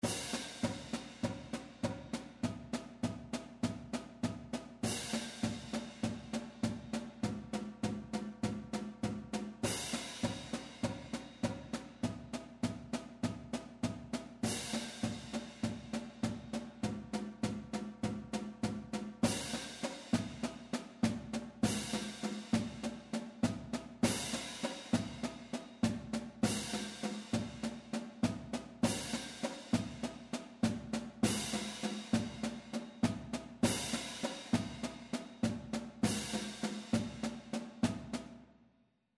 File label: cadence; chase